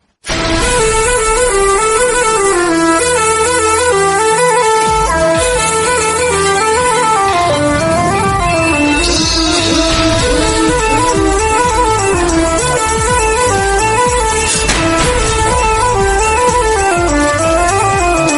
instrumental Bollywood song tone ringtone

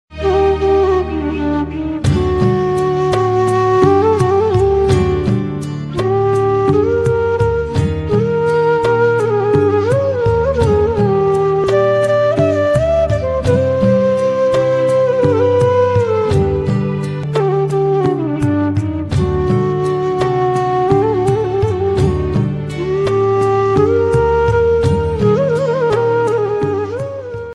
flute sad ringtone

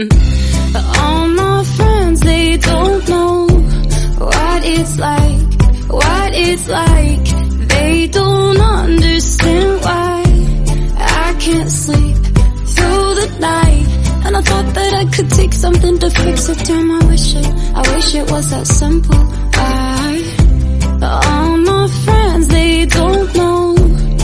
english song tone ringtone

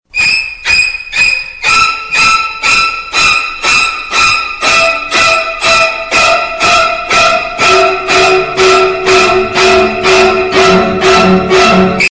horror music ringtone

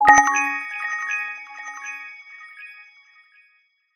htc ringtone; myrtle

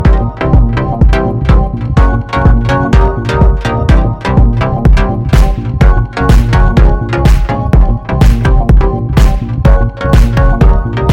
beat ringtone

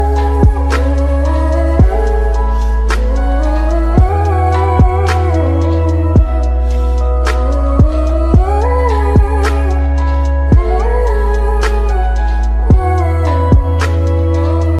popular ringtone, english ringtones

